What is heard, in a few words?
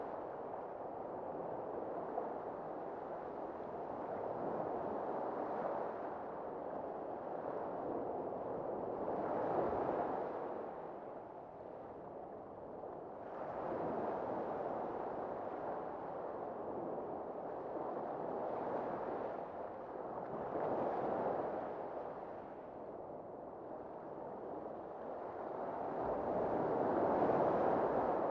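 reef day loop, ambient soundscapes; dota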